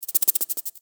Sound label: Exterior Forest Critter; Warframe; Duviri; Ambience